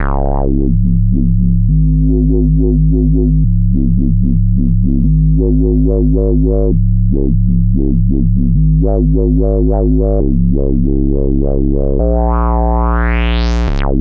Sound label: Wow Bass, Drum n Bass; KJ Sawka; Drum n Bass & Beyond